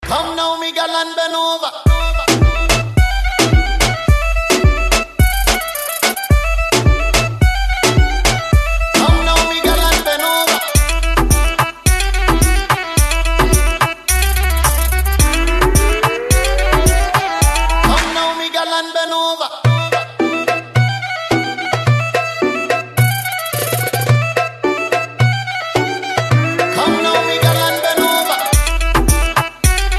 Big Truck Oriental

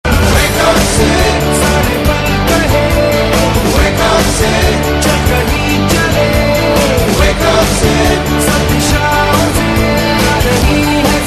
Club Mix

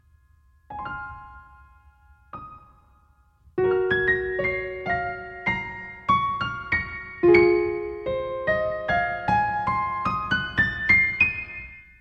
A Rainy Morning

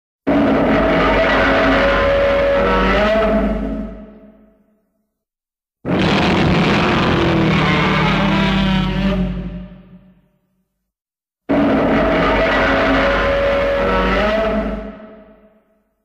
Roar; Godzilla 1954

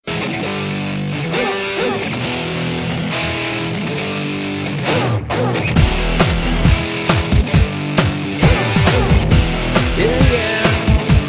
Beat

